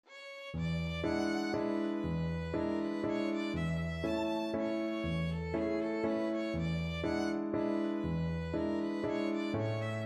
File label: german dance, violin; schubert